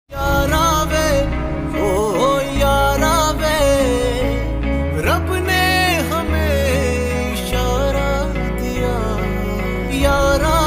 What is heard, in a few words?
ringtone